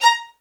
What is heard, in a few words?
MED ATT A# 6, soft violins; Q Up Arts; Denny Jaeger Master Violin Library